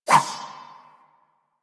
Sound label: Rapier Whoosh; Swords